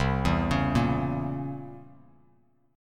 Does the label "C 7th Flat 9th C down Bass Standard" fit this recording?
Yes